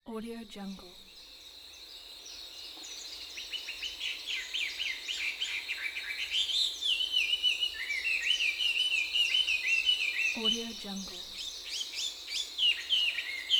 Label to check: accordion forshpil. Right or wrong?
Wrong